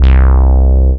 Bass Note C

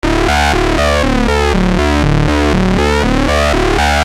Syn-Bass s D, Techno Synth Loops, 120bpm; Sonic Foundry (Sony Creative Software); Signals I

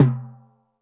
TD-20 Tom A, Roland TD-20 Toms

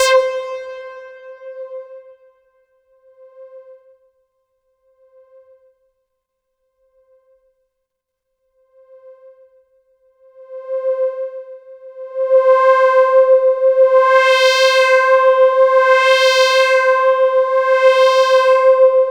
Roland JV 2080, interval; MG INTERVAL

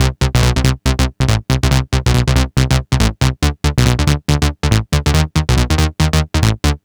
NRG Bass, Trance; Basses; Sonic Foundry (Sony Creative Software); Trance NRG VOL-1

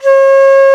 Venu C#4; Multisamples; Planet Earth; Digital Sound Factory Planet Earth

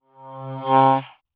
HUMMIN; Rarefaction; Poke in The Ear With A Sharp Stick

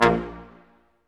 BRS Quintet staccatos, Symphony Orchestra; Roland LCDP08

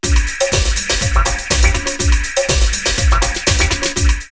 122, drumloops, Techno&House; X-Static Goldmine; 90 s Sample CDs

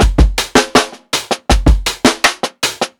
PICCOLO 80 16TH BEAT, DRUMS LOOPS

